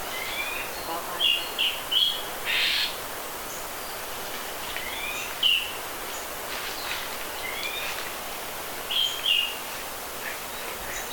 zvuki golosa belogrudogo peresmeshnika

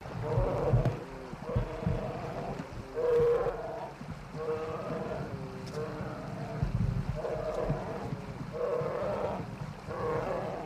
zvuk rychanija medvedja

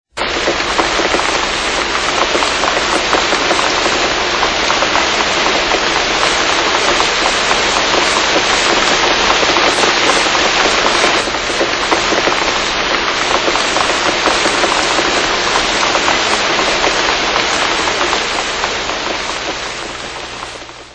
shuma dozhdja

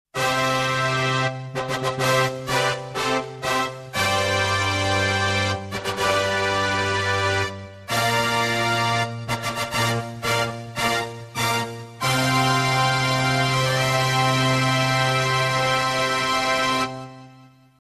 fanfary

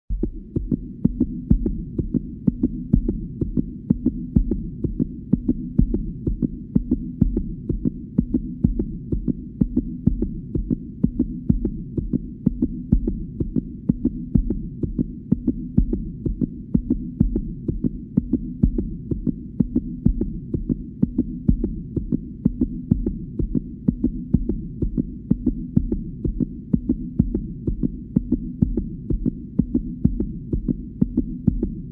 Stuk Serdca bystryy

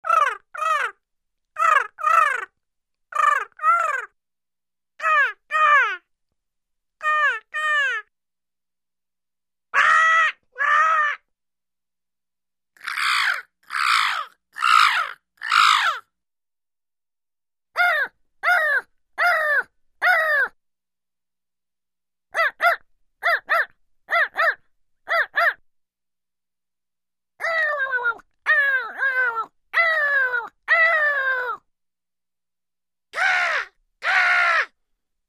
imitacija karkanja vorony

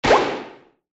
lopaetsja sharik

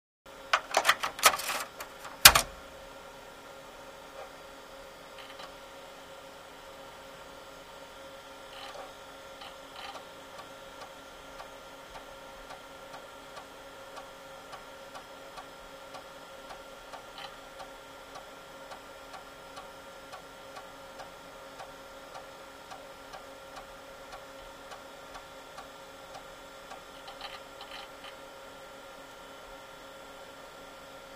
zvuk diskovoda i diskety

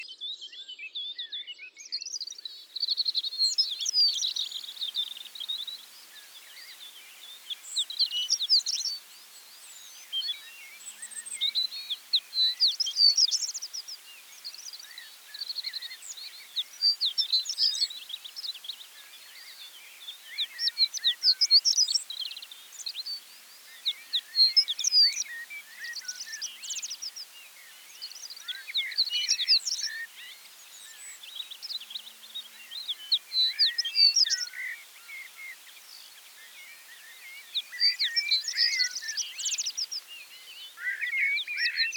rogatyj zhavoronok

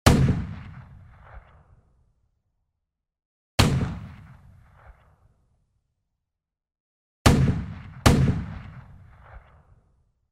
vystrel iz protivotankovogo orudija

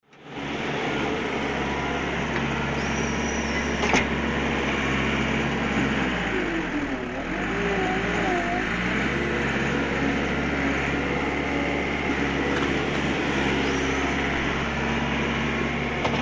pogruzchik bobcat

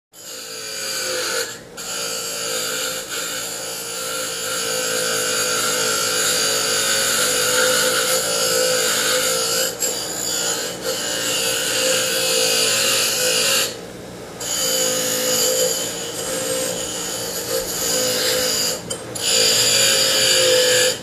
tochilnogo stanka

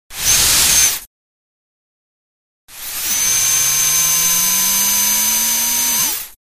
balon s geliem

